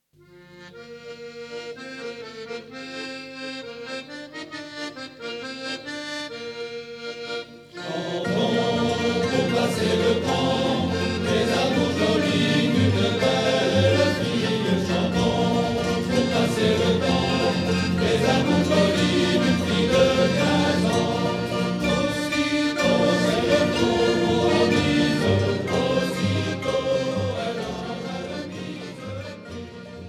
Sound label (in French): chant